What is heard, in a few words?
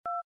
dialsound 8; Infinix Hot 12 Play